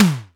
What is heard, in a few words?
tom; Crypton - DrumBase5000; CFZ KIT 2